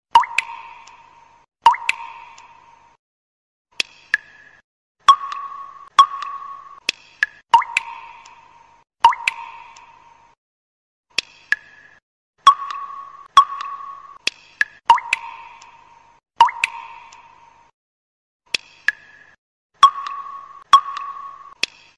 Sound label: water drop message ringtone new message and call tone water sound